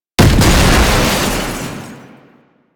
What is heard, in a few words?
Shield Lancer Weakpoint Destroyed; Warframe; Lasrian; Lasrian Soldiers; Weapons